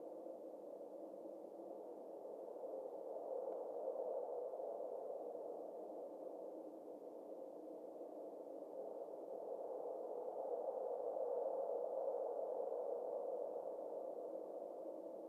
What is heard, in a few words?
atmos outside wind chasm; Hollow Knight: Silksong